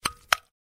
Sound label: Double Sticky Clap